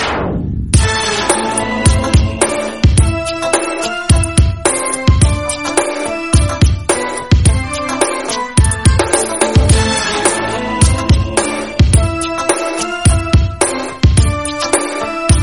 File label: 80s hits version